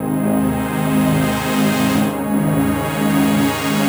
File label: C 123, Synth Loops 123bpm; Zero-G; Soundclash Nu Dance Electro; Acidized